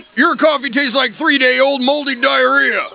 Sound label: diarrhea